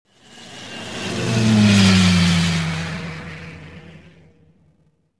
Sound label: shuttle flyby; movers objects